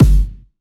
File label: 127BPM Kick; Vengeance Samples; Com Pop Kit